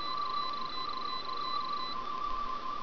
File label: tricorder